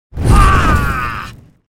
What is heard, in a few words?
ArmorBUff; Goddess of Trampling